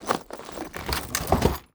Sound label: search radio; anemoi